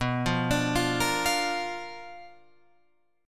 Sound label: B-Minor Major 7th Flat 5th B down Guitar Open F; BmM7b5 arpeggiated; JGuitar